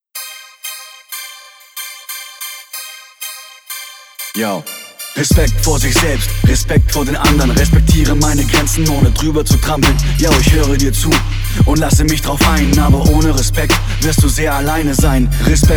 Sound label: Scratch; Respekt